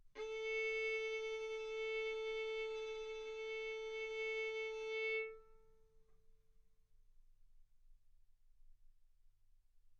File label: Violin arco A4 B4; mf; sul A; stereo